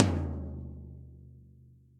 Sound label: Tom Floor Cop Style; Drums; Drum Hits; Ableton; Factory Packs; Session Drums Studio; MPE Hits